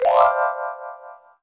Pullout Wand

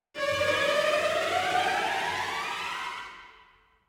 scare FX; hot lava; ios macos windows

